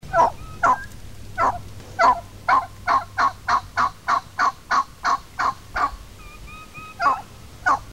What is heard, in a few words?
Old Hen Assembly Yelp